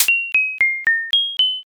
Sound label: cracker